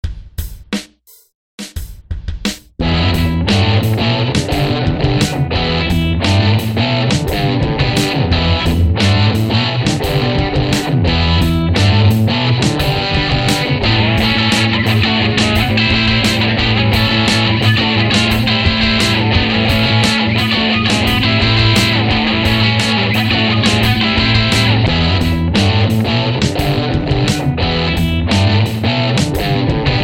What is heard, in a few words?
Jam